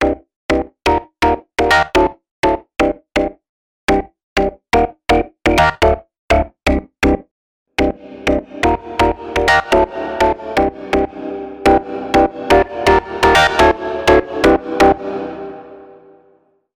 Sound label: HOUSE PLUCKS